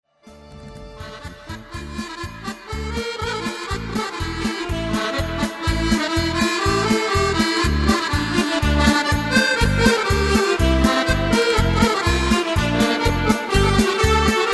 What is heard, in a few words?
pasodoble, golden accordion hits